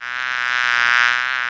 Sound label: BEE; animal fun 13